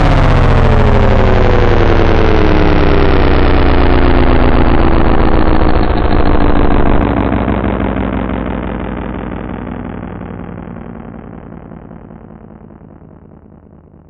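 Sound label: gravi stop, platform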